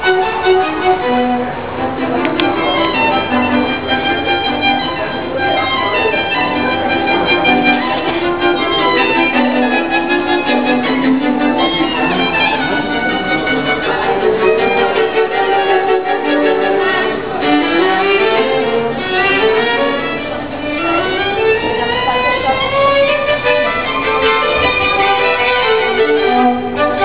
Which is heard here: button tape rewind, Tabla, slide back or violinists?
violinists